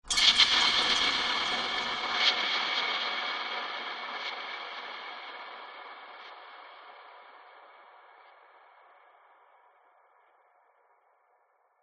sound design cinematic stab bright stutter jump scare